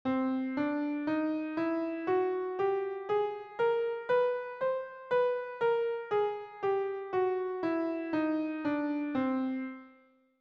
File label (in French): gamme transpo